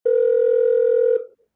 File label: video dialtone; bitrix